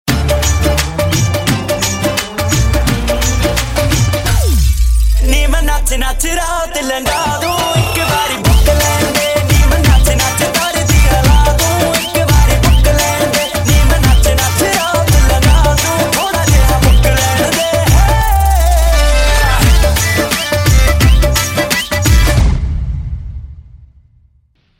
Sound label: Epic Bhangra Promo